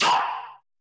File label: DISTORT HIT, TECHNO; Drums In Your FACE!